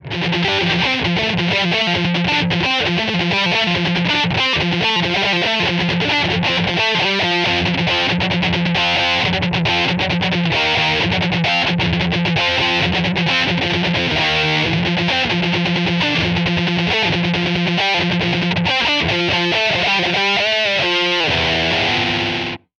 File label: Happy shredding